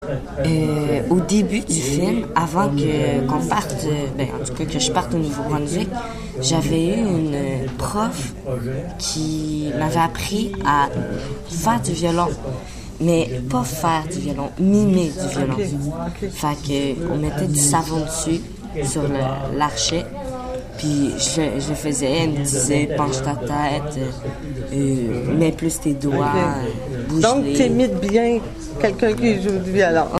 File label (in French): violon